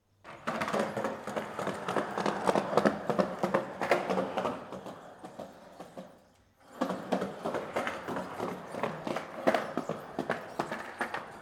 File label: Skateboard Indoor